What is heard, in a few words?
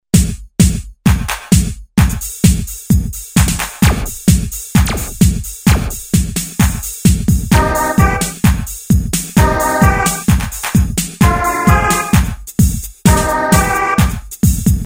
drum tr909, korg n364